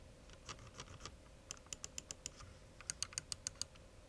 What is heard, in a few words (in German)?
Sound Scroll MM DPI